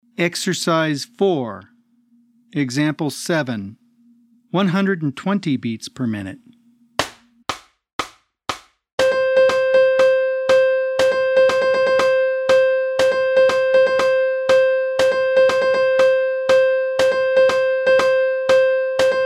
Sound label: 120 BPM